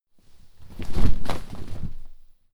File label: clothes hit drop mid